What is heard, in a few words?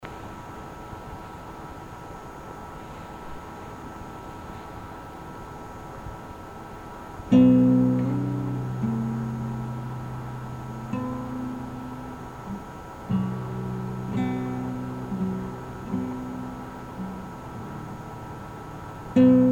Guitar